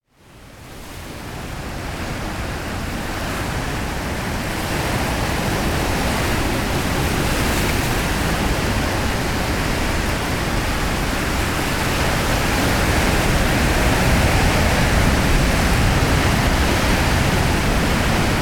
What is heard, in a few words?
Sea Ocean Storm - Heavy Waves Surf And Sea Rumble; Hollywood Edge; sound designer's toolkit v2